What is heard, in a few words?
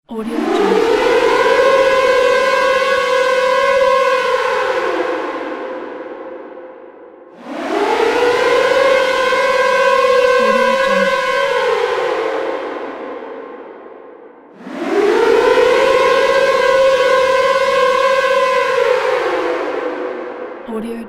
air raid siren